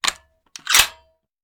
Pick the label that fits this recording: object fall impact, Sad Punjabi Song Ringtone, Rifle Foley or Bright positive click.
Rifle Foley